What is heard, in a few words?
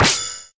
Super Hero Change Start